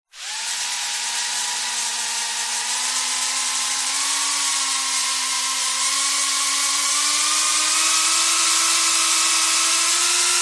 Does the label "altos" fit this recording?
No